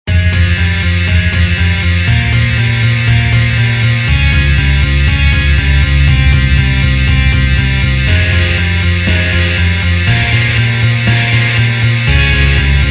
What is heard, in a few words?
Metal Band